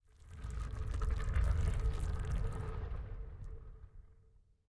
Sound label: empty ruin; forgottenruin